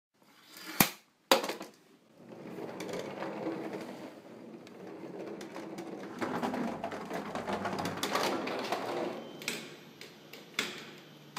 Efecto de sonido de MALETA CON RUEDAS TROLLEY WHEEL SUITCASE